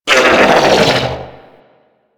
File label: Corpus Scream; Enemies; Abilities; Warframe; Amalgam